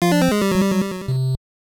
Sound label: Player Death, nes; demon sword jp 1988